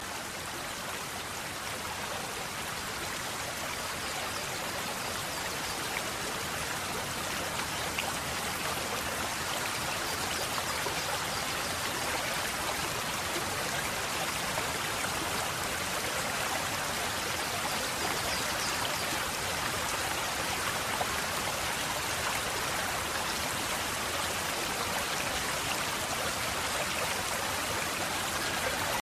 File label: nature sound ringtone